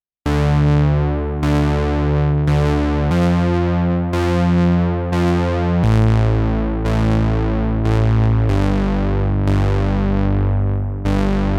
Moog Bass vs Minimoog; T-6

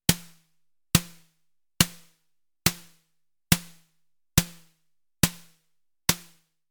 snares; Behringer Neutron

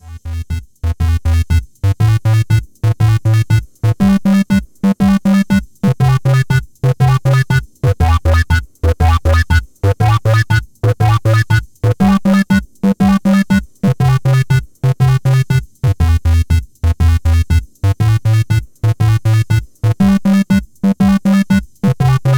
Novation Circuit Mono Station; Factory Session

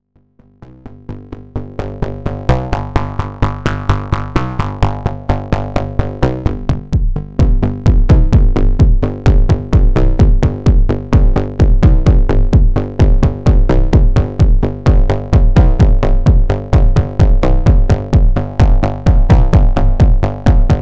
Knobula Kickain Mono Spectral 2 Bassline Kick Acc

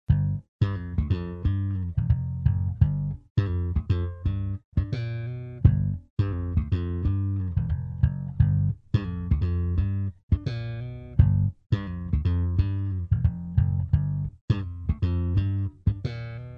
EBS slap

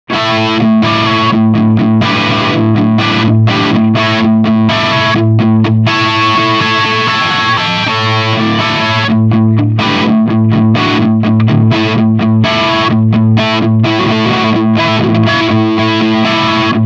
Distortion Triode

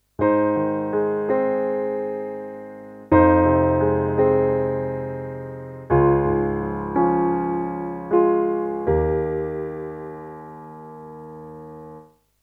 Alesis Prestige Dark Piano

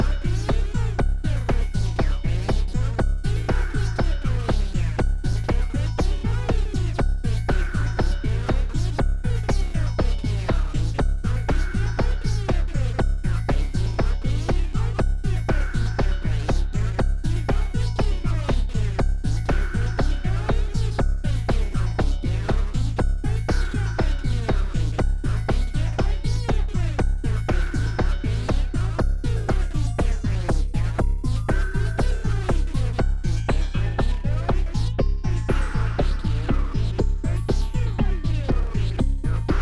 SpazeDrum

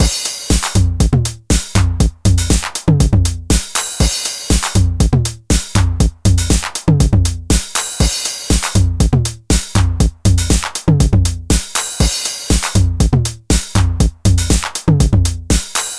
TR 909; WaveAlchemy Revolution

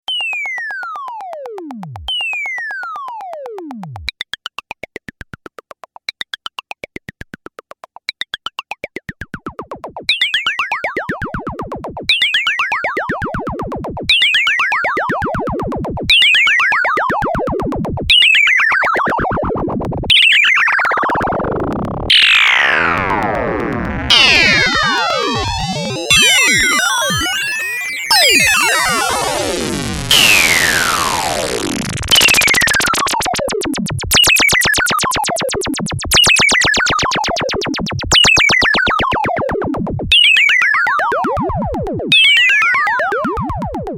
Synth Ringmod

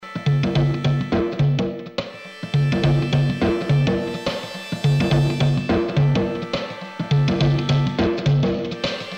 Amp Sim

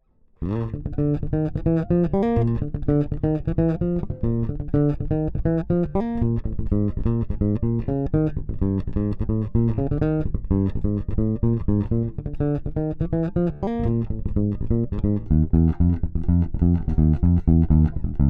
Bridge Pickup Singlecoil; Lakland luxe

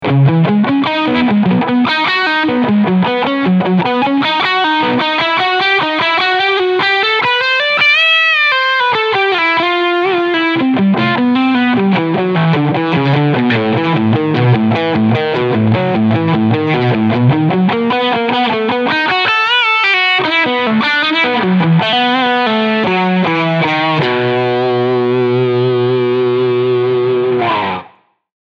Quilter Superblock UK 1979 Full Gain

